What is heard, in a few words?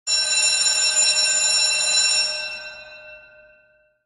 Fire Alarm; Lethal Company; Windows